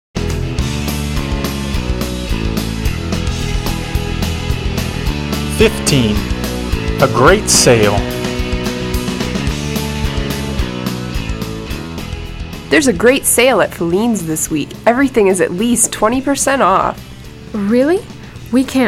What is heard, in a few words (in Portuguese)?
Trilha